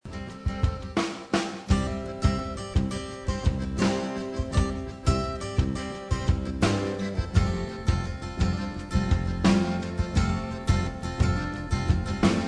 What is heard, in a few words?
Cajun Bayou Breeze